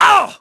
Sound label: damage; Pulse Racer